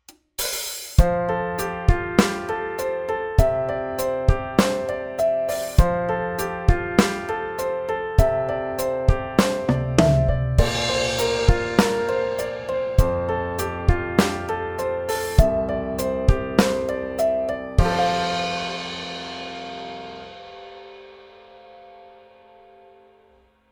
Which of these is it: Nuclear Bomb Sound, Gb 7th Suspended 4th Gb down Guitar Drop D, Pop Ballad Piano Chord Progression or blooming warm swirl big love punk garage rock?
Pop Ballad Piano Chord Progression